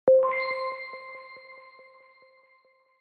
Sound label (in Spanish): like suave